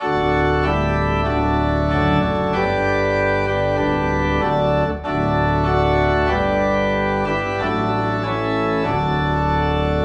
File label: Organ Low in D; Tis So Sweet to Trust in Jesus